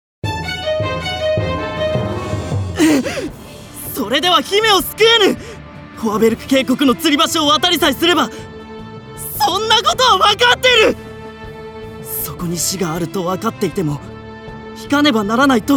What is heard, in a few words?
bgm